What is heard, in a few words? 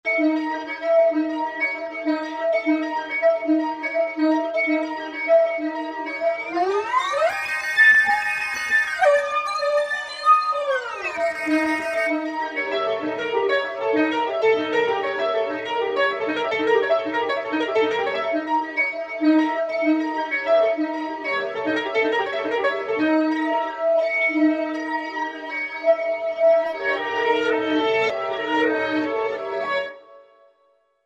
Roland V-Synth; Running Away; Factory Patches